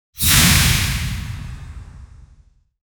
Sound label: fantasy fireball spell